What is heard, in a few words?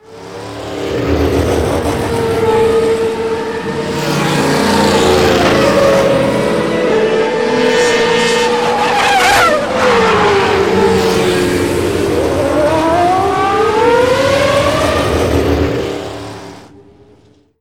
formula 1; Äänitehoste; daniel simon